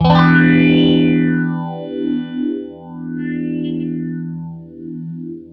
Clean Phase Emin7, guitar, clean phaser, chords; Guitar Special; Future Music

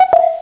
PULSE

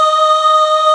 Vox D#4

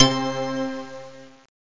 digi-step, keyboards; Zoom - Release 2; Active Software

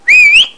Invite

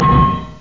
low smash; chatterbox v1.0

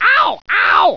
BUMP; Screen Wreckers

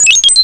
computer bleep, electronic sounds; Sound Effects for Multimedia - Volume 1

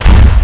CLUNK

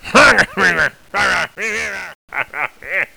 hurumph

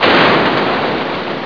EXPLOS

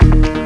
LASER HIT

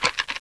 Grit; Princess and the Pea; SoftKey

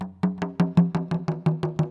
PERC LOOP ETHNO 125; eJay

